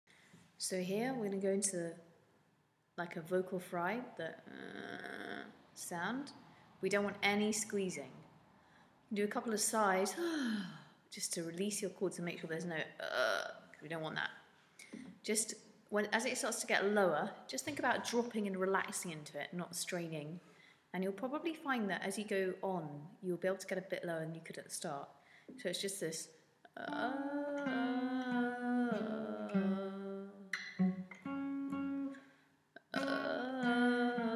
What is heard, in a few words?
Low notes into vocal fry